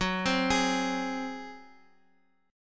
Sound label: F sharp Suspended 2nd Flat 5th, Guitar; arpeggiated; JGuitar